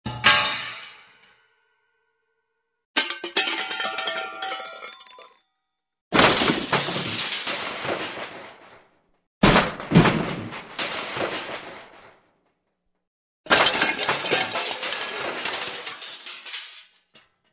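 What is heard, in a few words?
car crash, pieces of flying metal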